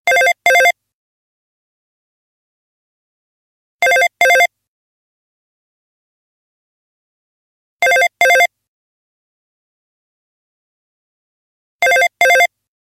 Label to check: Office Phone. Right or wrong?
Right